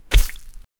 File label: gore